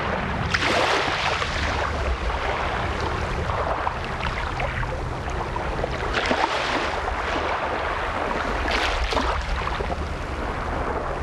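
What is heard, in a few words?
ambience lagoon water lap shore; Titanfall 2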